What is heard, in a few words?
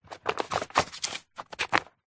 office pile of plastic folders document wallets movements